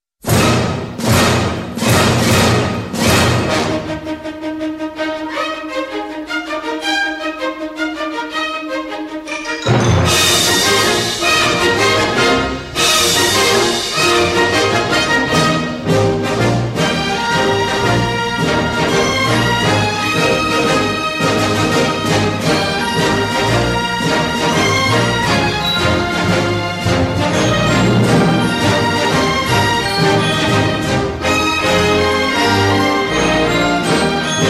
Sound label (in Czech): fanfára